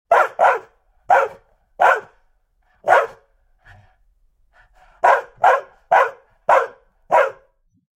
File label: dog barking energet